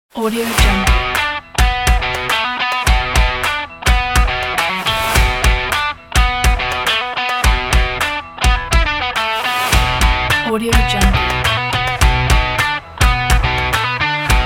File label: energetic rock sport